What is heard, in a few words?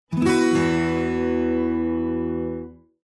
Windows guitar off